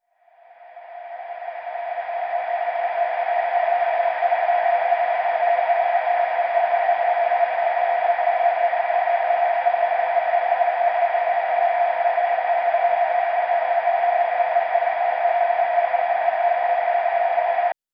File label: vocal pad; Crowdsourced Sample Pack; TRUTH-HZ; Pads